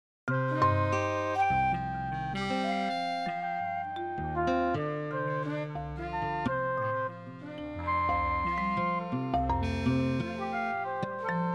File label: very septimal dorian harmonious; Al Farabi's